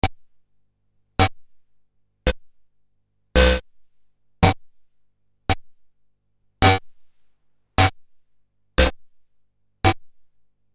Korg DDD 5 Tune Mod

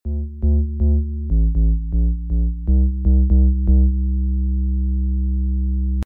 Sub Bass

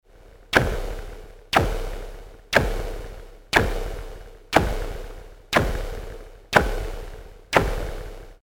Kobol Submarine Snare Alternative Einstellungen

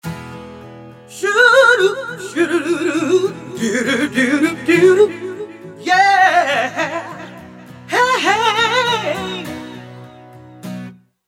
BOSS VE2 ENH Green Unison DelayReverb

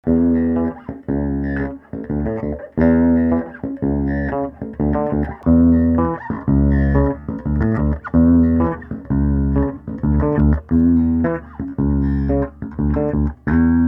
horn high bright bass cut; ashdown; steg front beide

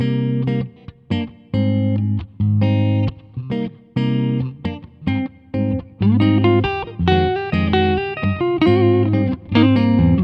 Sire Guitars H7V Clean Neck Both; Larry Carlton

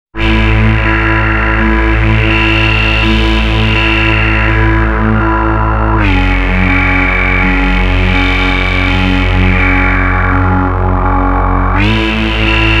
Dreadbox Polyend Medusa Hybrid Mono

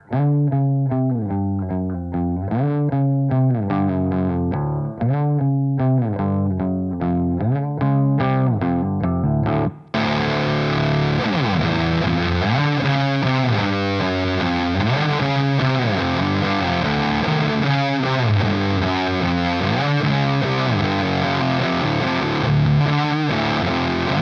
Distortion A Stacked Fuzz B

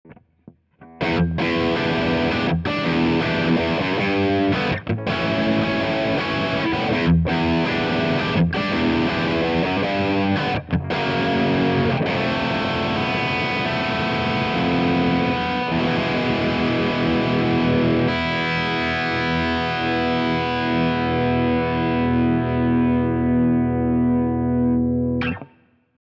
Mid Gain Bridge Voice 1, Schecter SLS Elite PT

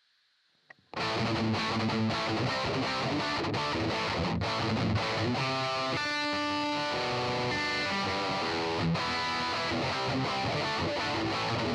EHX 5150 Rhythm Burn PRS DI Out

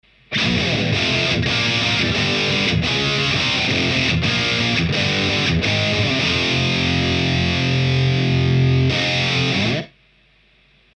TwoNotes OPUS; Preset 36 Man Of Sand

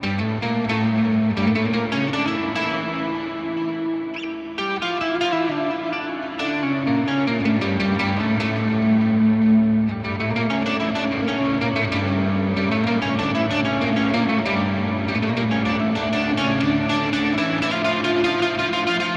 Neural DSP Quad Cortex Egyptian Dream

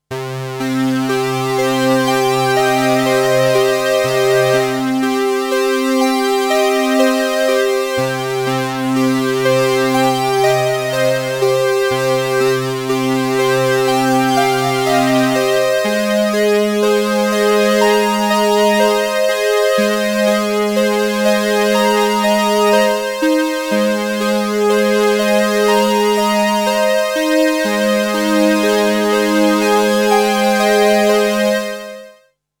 Boss CE-300 Juno Chorus I

